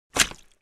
Single footstep wet muddy puddle

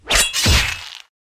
Sword swing swipe hit body

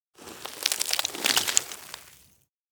Falling dirt debris dust dropping on wood

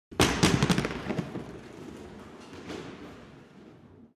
Bowling ball gutterball

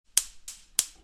Gas stove turn on gas clicks ignition

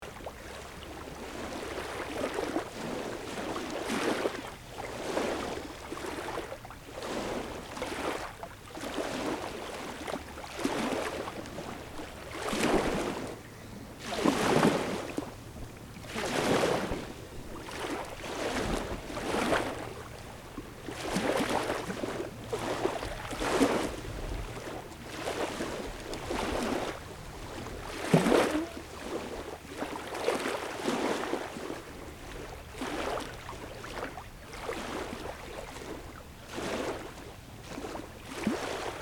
Gentle ocean shore waves ambience

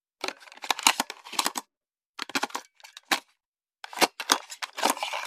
Cassette player mechanical handle

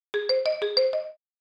Marimba alert message

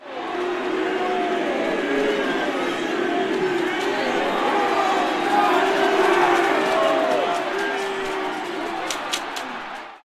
Rugby match crowd booing

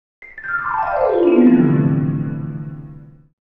Old vintage cartoon dizzy marimba

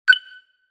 Futuristic alert beep

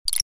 Futuristic pop up

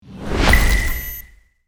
Podcast intro outro sonic branding

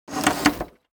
Sci fi gizmo mechanical movements

